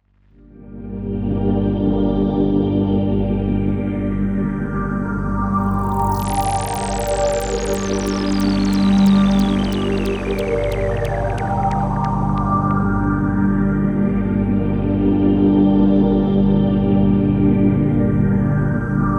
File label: Solina; Pink